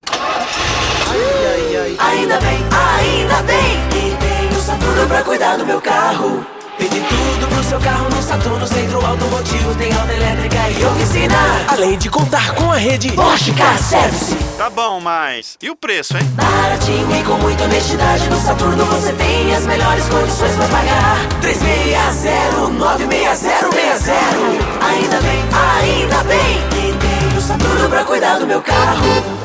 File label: jingle; saturno